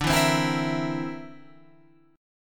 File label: D 7th Suspended 2nd Sharp 5th D, Guitar Open F; D7sus2#5 strummed